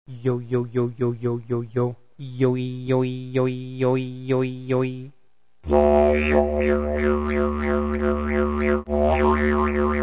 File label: boomarangs, didgeridoo; non-vocalized yo-yo-yo-yo-yo or ayoee-yoee-yoee-yoee; boomerang as it travels through the air; Timeless Productions; didgeridoo tutorial